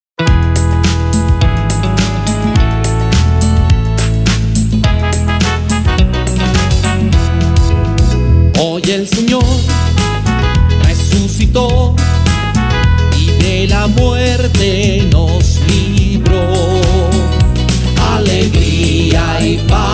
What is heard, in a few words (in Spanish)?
canto de salvacion Sabado santo